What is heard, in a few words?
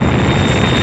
plane engine; Enemy SE; Nintendo; July 2020 Gigaleak